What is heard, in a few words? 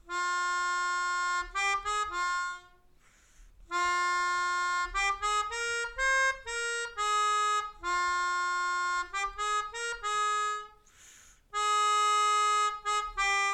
Melodica